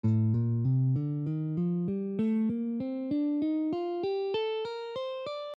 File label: A Locrian